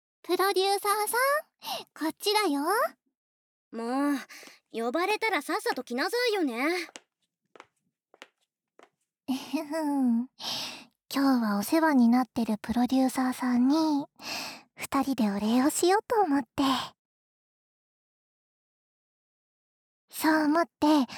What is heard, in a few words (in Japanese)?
連続耳舐め3P手コキ; エフェクトあり